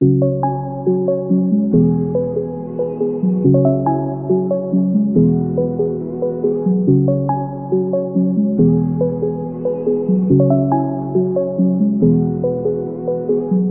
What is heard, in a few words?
Nostalgic Melody Loop 140 BPM G# Min Bells; Melodies; Loop Stems; Cymatics; Oracle Sample Pack